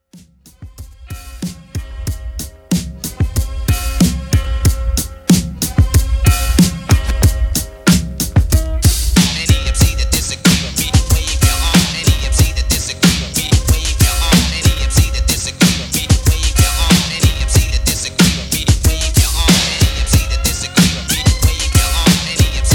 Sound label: 90s Redrum 93Bpm